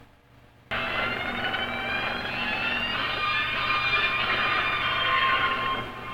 alpha call; ghidroah

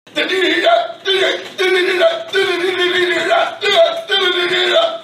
blues

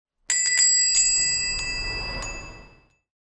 doorbell new

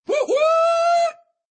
woot woot ringtone

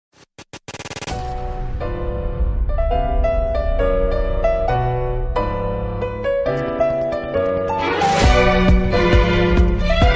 piano anime

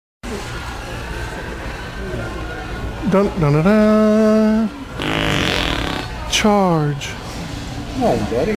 fart

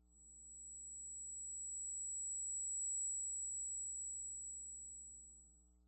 high ring ing

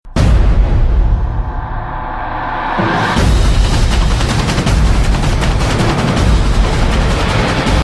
epic war music

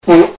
my dogs reaction to a fart

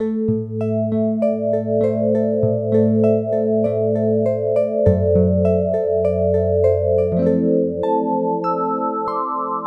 toaster sound effect; tubby

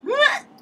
snappy laugh, Short Squawk Laugh

